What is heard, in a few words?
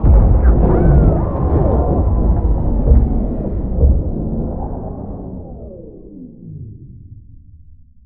repair stop, vehicle; CosmicRage